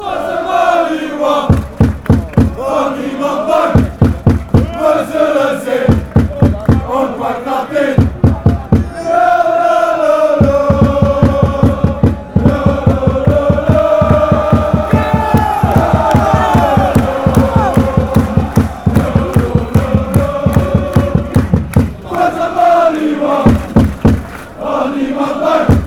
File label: mechelen chant